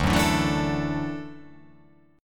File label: D Major Add 9th, Dadd9 chord; strummed; JGuitar